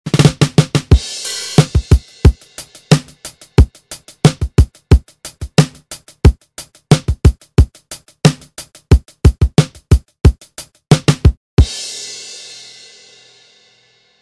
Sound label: Glitterball